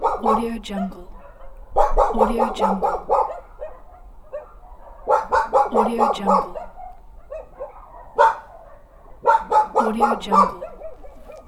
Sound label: village dogs barking